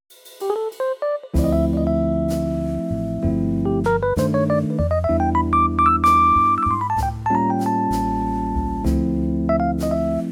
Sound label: impossible rhodes trio C